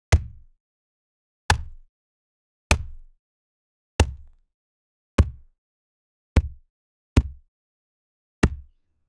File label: sharp smack sound of volleyball